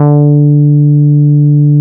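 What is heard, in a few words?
NORD MP D4, bass; NORDY BS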